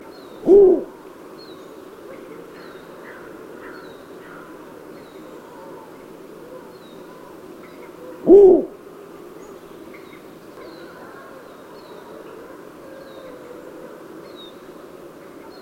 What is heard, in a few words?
Snowy Owl, Bubo scandiacus; owls